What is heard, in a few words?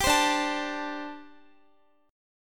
D 7th Suspended 4th, down, Guitar; D7sus4; strummed